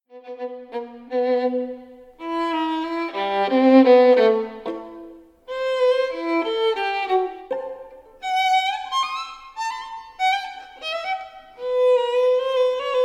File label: cadenza allegro